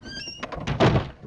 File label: doors big; Mafie 1